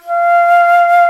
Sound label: flute F3, ebony flute; InVision Interactive; Lightware VOL-1; Instruments & Percussions